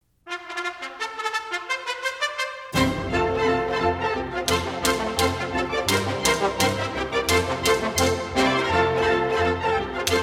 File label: Whip and Spur, Wind Ensembles; Allen; Eastman Wind Ensemble; Screamers